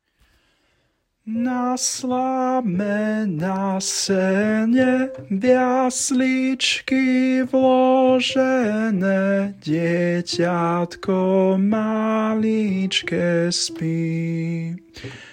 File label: tenor, song